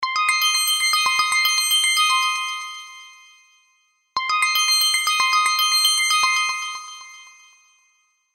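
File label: Iphone XS Bliss, ringtone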